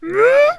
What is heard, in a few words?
Attack Vocals